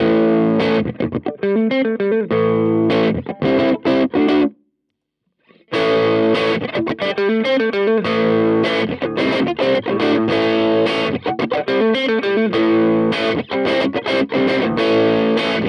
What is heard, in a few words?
Compadre Clean Boost Treble